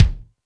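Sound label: kick Slammin, Alesis D4 drum machine; 300 Drum Machines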